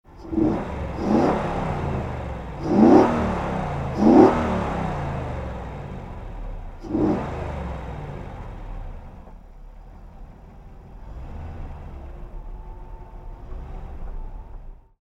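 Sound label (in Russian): автомобиль готов пересечь стартовую линию пока двигатель не заглушил звук холостого хода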